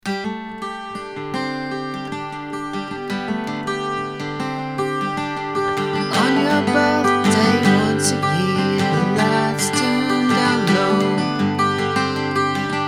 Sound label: happy Birthday song